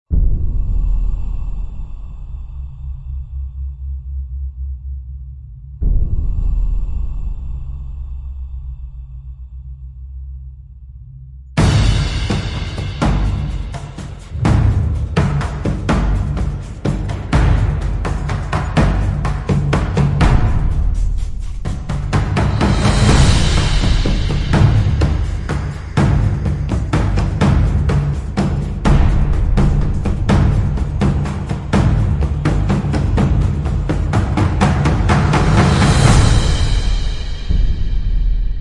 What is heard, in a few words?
Drums Only Version